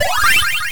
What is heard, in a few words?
item get; mazeofgalious